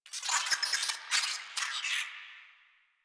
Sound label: Space Drone Attack; Warframe; Corpus Space Drone